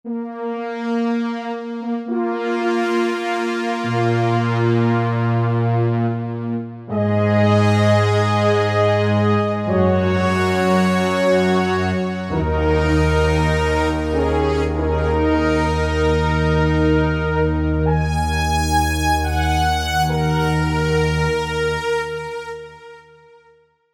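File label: Soaring Horns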